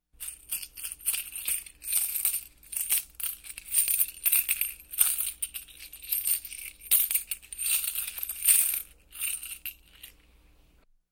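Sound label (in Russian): rattling coins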